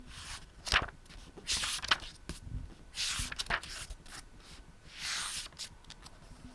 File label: book; 本をめくる音